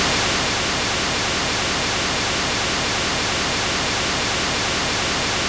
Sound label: E NZE, Yamaha DTXpress, Drum Loop